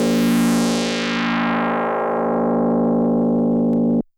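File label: DX100 A#0; rocket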